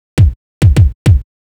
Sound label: Kick, 102 Cmin, Drum Tracks; Hip Hop; big fish audio; Hip Hop Exotica; Apple Loops